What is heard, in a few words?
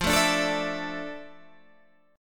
D Suspended 2nd F, chord; strummed